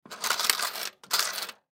Coins Grab Coin Dispenser Drawer; Money; Foley

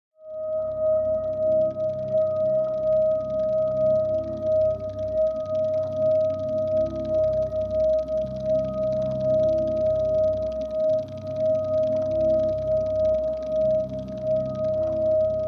Drone Bell Chopper Vibrato; Production Element Imaging Element Texture Bed Atmosphere Synth; 5.1 STEREO MIX; Blastwave

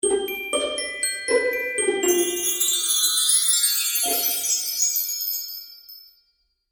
Magical Music Finale, Cartoon Music Sound Effects

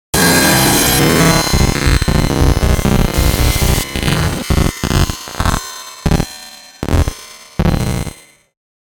Bed Short Harsh Glitch; Production Element Imaging Element Accent Transition

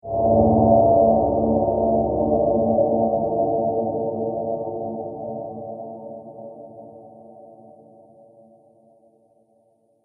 Future Motion, Science Fiction Sound Effects

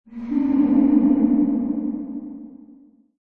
Ghost Laugh Reverb, Eerie, distant, ghostly laugh; Horror; Passing ghost or spirit being hooting, reverberant echoing; Lynne Music

